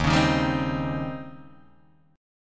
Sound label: Eb 13th, Guitar, Open D